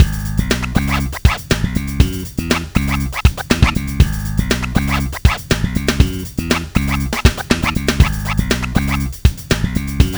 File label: scratch; BREAKIN BATTLE